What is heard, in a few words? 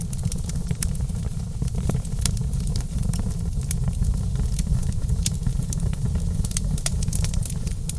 campfire; Ambient; ambient loops